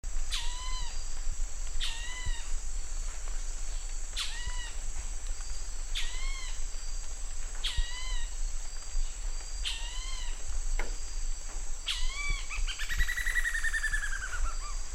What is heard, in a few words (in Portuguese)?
canto do arapacu barrado da amazonia